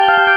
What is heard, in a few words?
SYNTH ARP